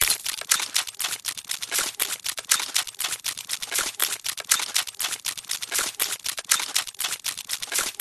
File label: Steampunk Mechanical Loop 120BPM; PMSFX